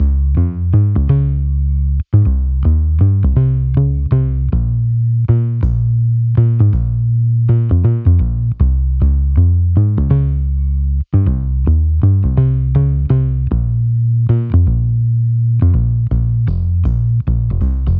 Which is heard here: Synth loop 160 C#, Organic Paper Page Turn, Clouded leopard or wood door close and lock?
Synth loop 160 C#